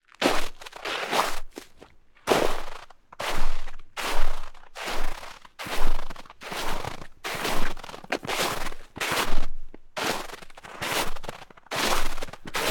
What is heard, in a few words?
footsteps walking crusty snow male shoes normal pace; sonniss gdc